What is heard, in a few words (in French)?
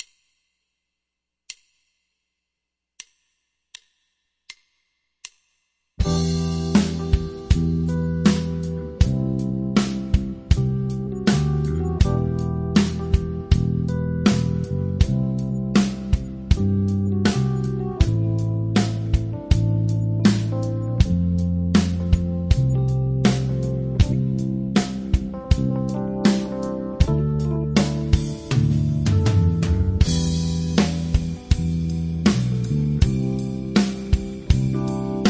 Dechiffrage Slow ballad